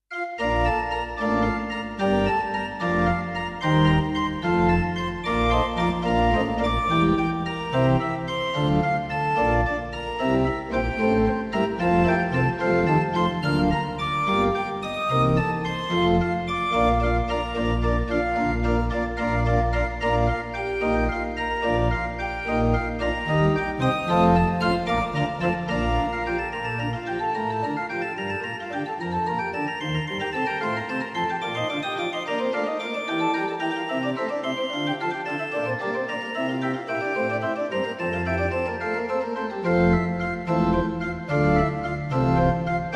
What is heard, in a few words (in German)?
Blockorgeln; Bach BrandKonzert